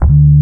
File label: BASS, Drum & Bass, 168 BPM; Ueberschall; Drum & Bass Flash Trackz